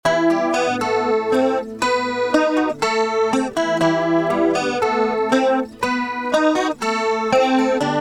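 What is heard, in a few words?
acoustic guitar polyflange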